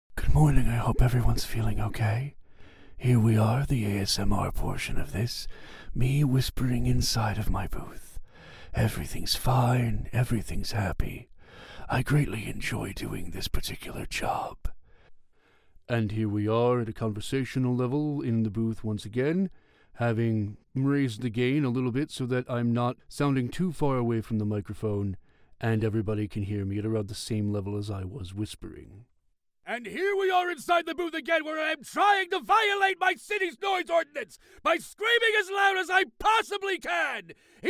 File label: Raw Booth Samples Room Tone 3 Claps; Rode NT1-A